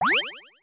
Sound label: hop; scuttlebug; sm64